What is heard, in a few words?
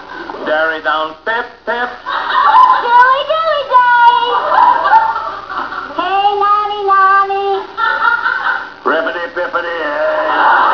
ditty